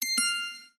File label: ti na; standard ringtones